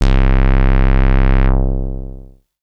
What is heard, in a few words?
BASS normal Low; Wunder